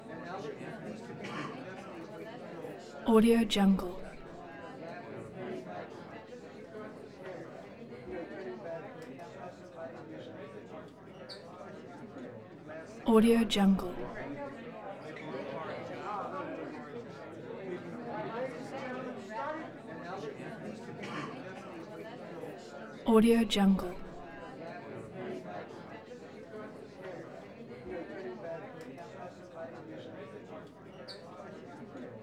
party walla

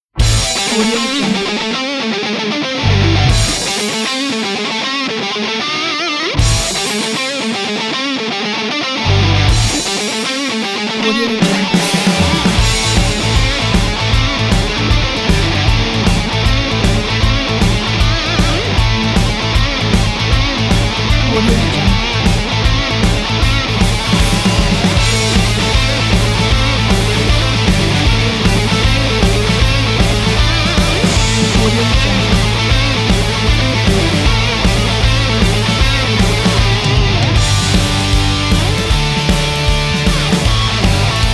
rock up

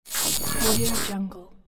cybernetic scanning

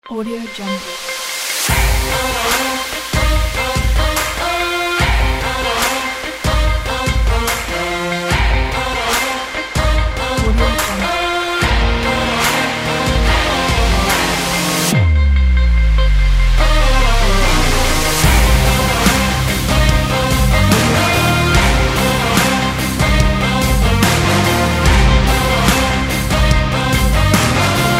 sport rock

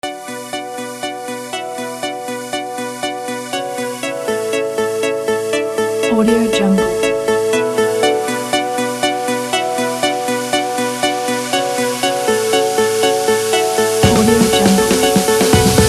modern tropical chill house

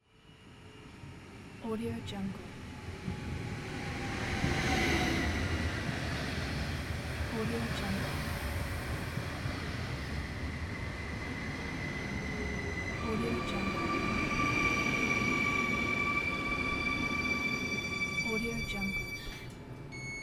train arriving at platform

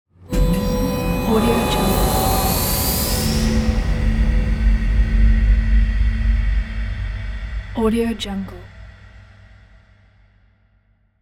activate shield

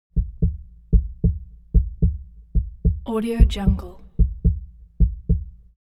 human body heart beat sequence; smartsoundfx

